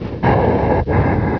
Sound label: breathe